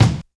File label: BD; Best Service; Dance Mega Drums